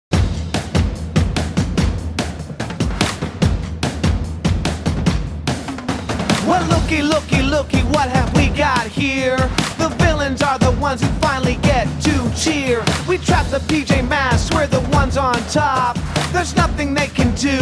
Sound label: Villain March with vocals